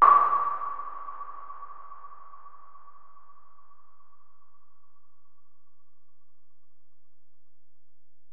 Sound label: EPerc, Alesis DM-PRO; 300 Drum Machines